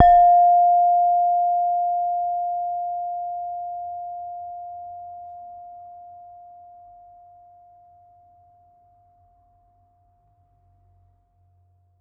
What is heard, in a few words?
Saron B, Gamelan